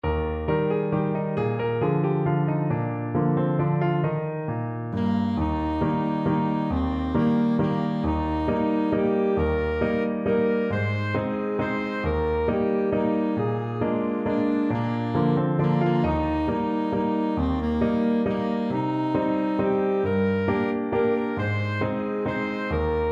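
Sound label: alto saxophone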